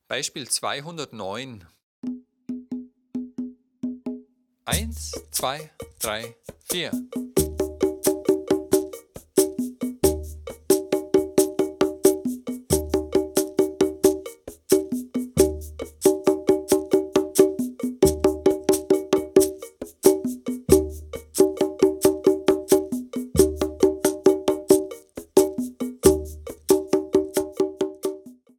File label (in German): dreierrhythmus